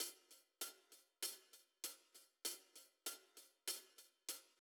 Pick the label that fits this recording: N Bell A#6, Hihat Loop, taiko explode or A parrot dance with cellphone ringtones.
Hihat Loop